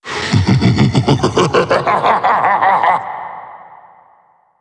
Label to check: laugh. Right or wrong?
Right